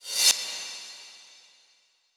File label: Cymbals Reverse Crash; Vengeance Samples